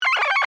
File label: Communication Radio, Scifi, Communication, Radio, Scramble, Musical, Science, Fiction; Blast Wave